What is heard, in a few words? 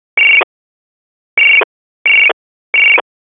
khz nfm